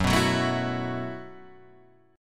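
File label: B 7th Suspended 4th G flat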